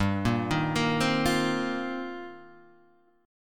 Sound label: D sharp 6th Add 9th, G